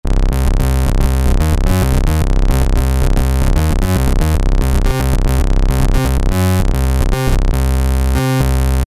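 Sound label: bass-TeeBee 2; E-mu Orbit V2; Factory presets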